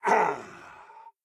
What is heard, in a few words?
boss Cog Angry